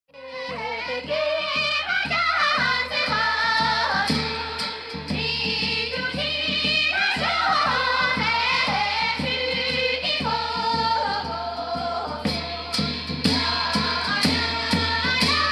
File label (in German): TIPA-Gesang, Ladakh